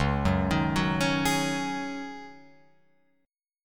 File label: Db Suspended 2nd Suspended 4th Db Guitar Open F; arpeggiated; JGuitar